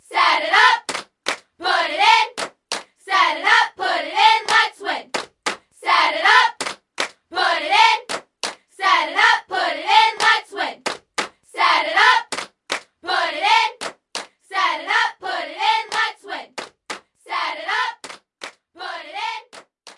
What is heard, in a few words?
Sfx Basketball